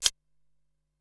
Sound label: Slinky Pop, Drum Machines Ensoniq SQ-R-Plus; 300 Drum Machines